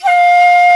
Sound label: SHAKU, WOODWINDS; Roland - LA Composer vol. 1